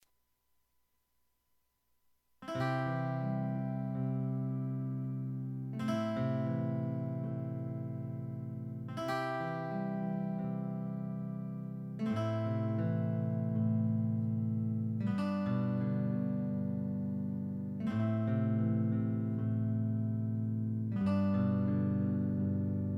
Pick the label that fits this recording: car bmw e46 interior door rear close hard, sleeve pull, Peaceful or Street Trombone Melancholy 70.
Peaceful